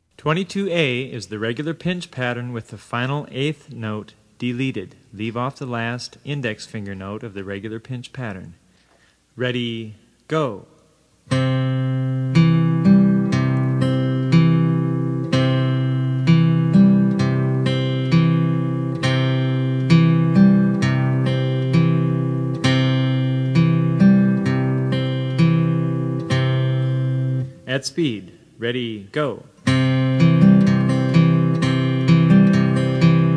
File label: travis picking